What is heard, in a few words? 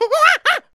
Monkey Near Falling; angry birds rio